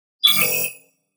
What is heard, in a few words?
Futuristic tone authorization error